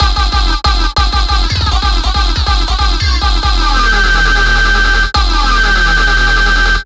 F Vowel Bass Loops

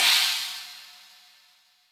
China Hi, Yamaha DTXpress Cymbal

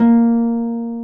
HARP A#3; AKAI S-Series CD-ROM Sound Library VOL-2

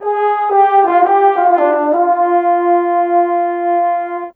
Horns, Orchestral, Rock Pop; Sonic Foundry (Sony Creative Software); Orchestral Series VOL-4 - Rock and Pop

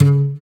GUI AC, Acoustic Slap, Roland

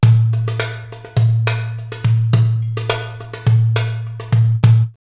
Torbruk 104, drumloops World Ethnic; X-Static Goldmine

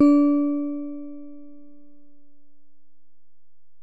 Bell, Alesis DM-PRO

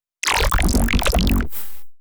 wet ass growl, bass

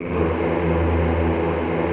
E1, Extended Classical Choir; Best Service

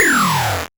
Noise A, 8-bit, Bitty Noises

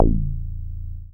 Bass SH-101

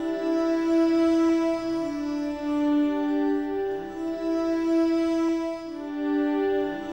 Scree Cycle, Acoustic Drone; Sonic Foundry (Sony Creative Software) - Friendly Fire; DroneLoop

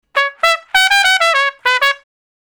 Trumpet Straight (Db), Horncraft for R&B Solos; Crimson Blue and Fabulous Horncraft 4 RnB

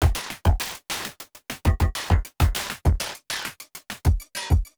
Beat, Industrial

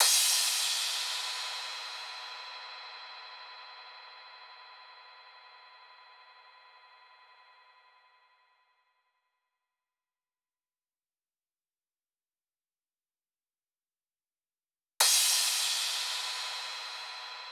128BPM Crash; Demo Constr Kits; Early Morning Kit Root G

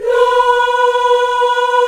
VOX African Las; Roland LCDP11 Africa VOL-1

